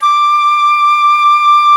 FLT XFLT, Flutes; Club-50 - Foundations Roland

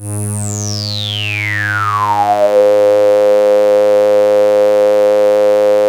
rising G#1; Best Service Alpha Dance vol.2

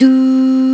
OOOHS, VOX; SC55; East West - Global Sounds

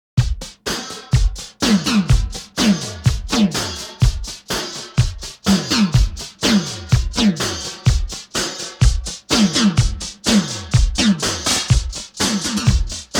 Analog Electronic Drum; Weltklang; Bizarre Planet

